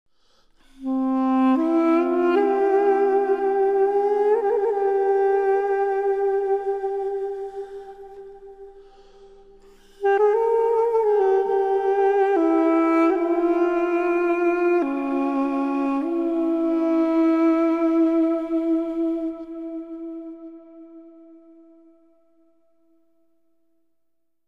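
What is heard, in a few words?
theme melody; Myst III Exile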